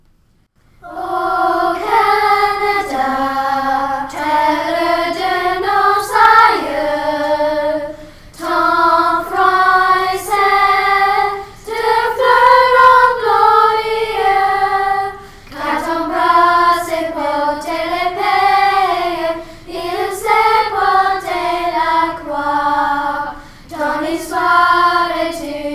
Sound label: Choir O'Canada Bilingual Version